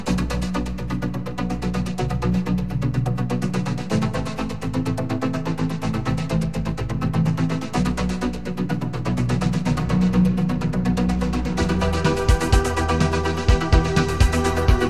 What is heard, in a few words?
EMS Vocoder; Vocoders